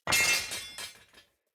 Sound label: Short Sword Drop; Weapons; Enemy; Swords; SinecureShortSword